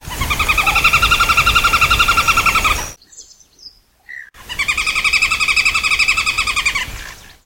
pelatuk besi

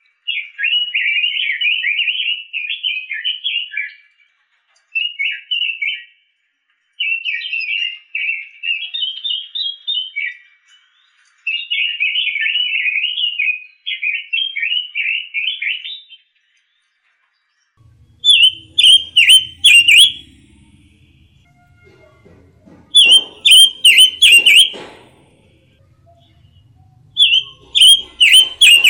kombinasi robin dan pancawarna

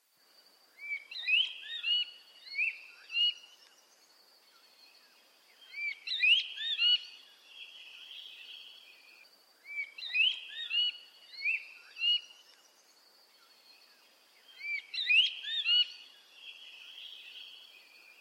kring kring buru

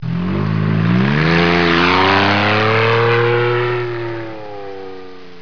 suara mobil

